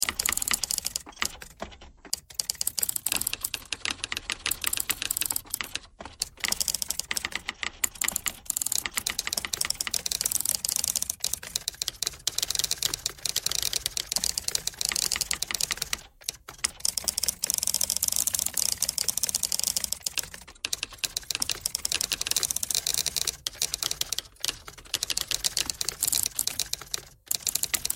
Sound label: typewriter thread pentatonic all